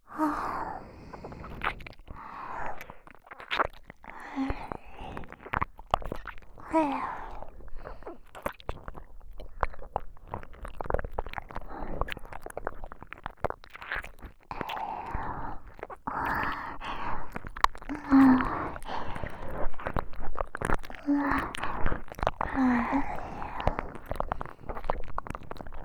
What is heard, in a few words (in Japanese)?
耳舐め 両耳 ループ